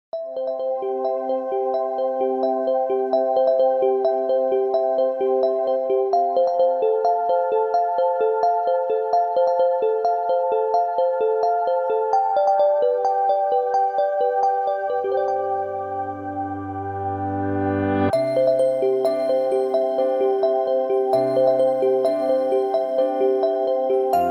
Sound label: Continuous, alarms; Itel itelOS 15